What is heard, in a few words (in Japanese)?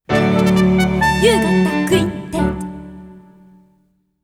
ミュージック・ジングル; You Gotta Quintet Songs; ユーガッタ・ミュージック・ジングル (アリア)